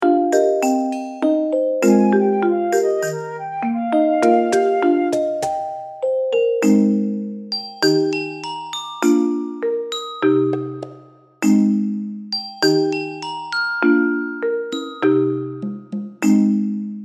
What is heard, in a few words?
Lullaby Arrangement